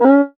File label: Cuica Hi, Percussion; Roland BK-5